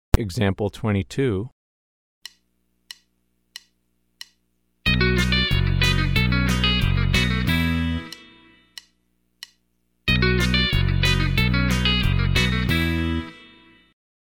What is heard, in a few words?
Country Rock